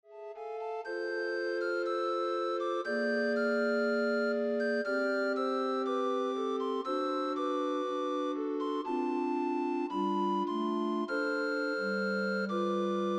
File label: Recorder Ensemble